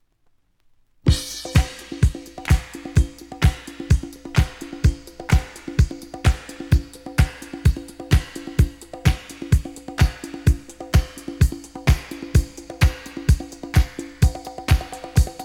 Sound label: instrumental, disco